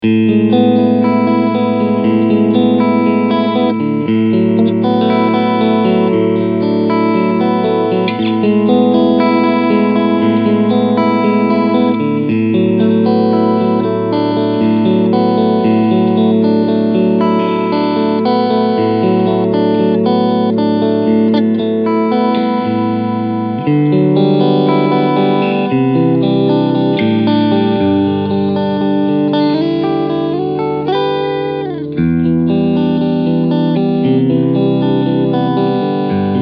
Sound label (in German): Clean Front Mitte